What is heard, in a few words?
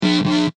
Electric Pulse Double, Two short bursts of high voltage electr; Two short bursts of high voltage electric buzz; Electricity sounds; Electrical Sound Effects